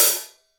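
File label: HI-HAT CENTER; Greytsounds; HI-HATS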